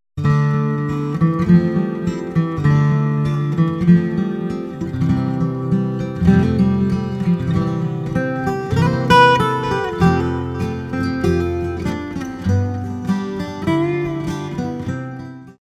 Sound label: Tango for a Sad Mood